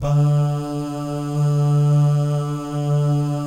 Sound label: BAH GUY, Vocal Planet Jazz & FX; ILIO; BAHS GUYS